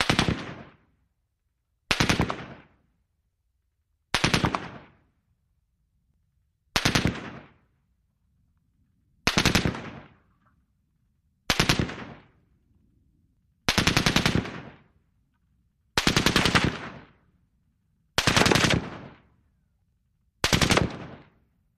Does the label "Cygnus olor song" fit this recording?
No